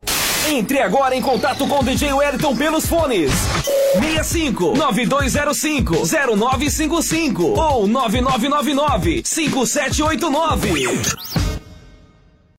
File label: Funk